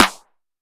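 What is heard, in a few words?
TR909 Snare Clap, Roland SH-32 Drumset; Drumset 04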